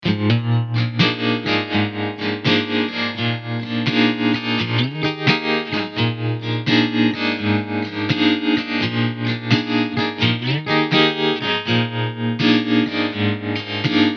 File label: vintage trem; mobius